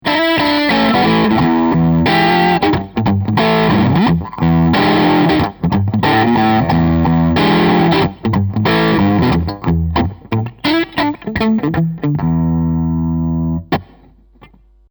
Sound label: Neck Crunch